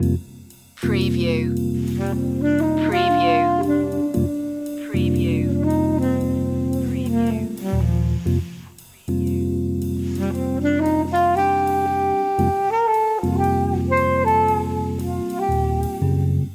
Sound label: pace loop